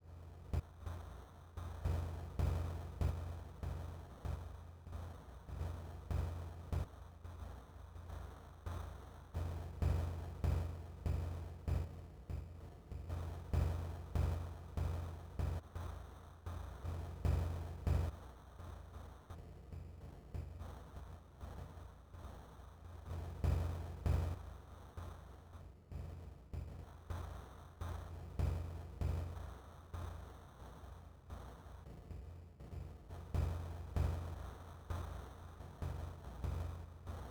Cm72 Zone Ambience

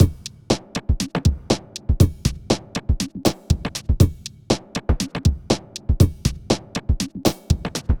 Drumloops; MixterPlus

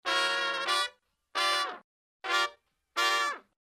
brass loop

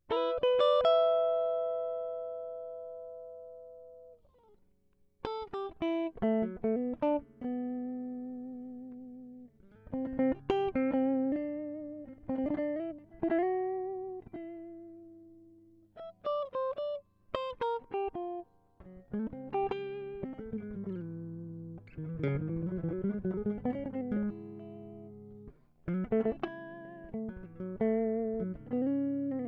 Jazzee Moteef

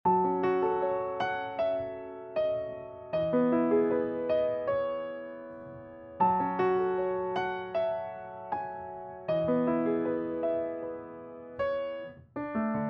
78bpm Piano Samples

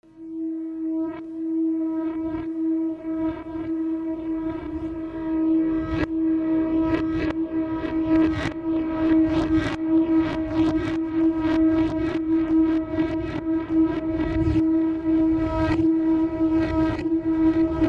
Intercepted Signal

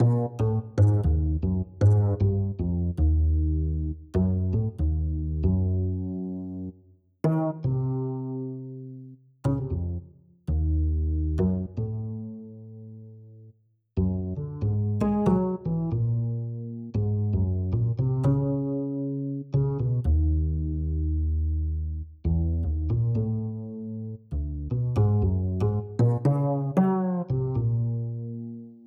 bass

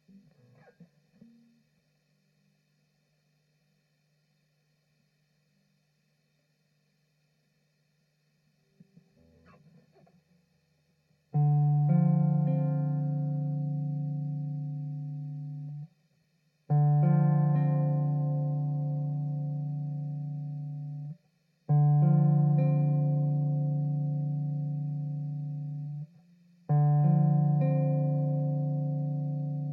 Dm96 Bluesy Groove in Five Four

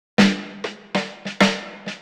Break; The Stubblefield

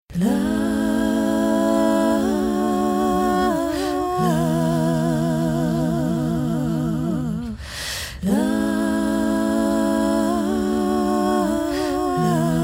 BG Vocals; Red Light Images

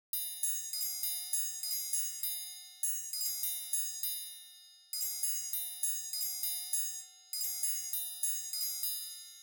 Triangle

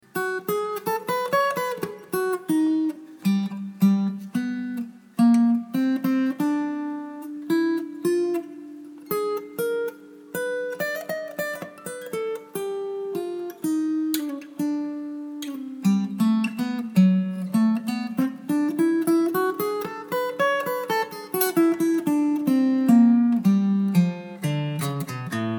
Jazzy Noodling

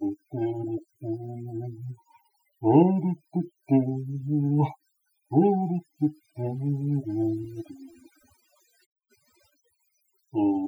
vocal loop

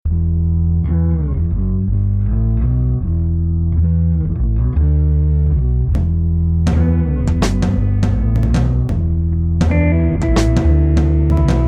E 102 Drum Lo Bass Gtr Buzz

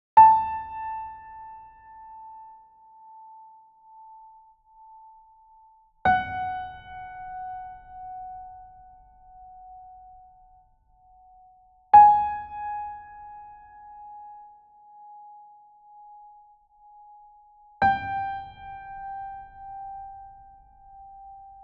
Piano

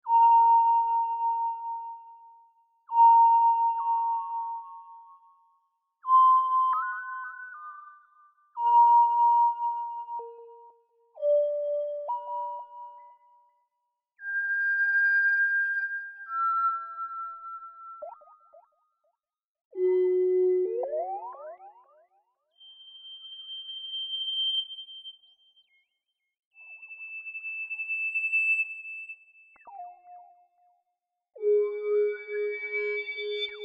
Skyloop Synth

